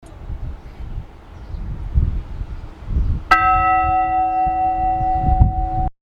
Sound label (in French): cloche; Wisques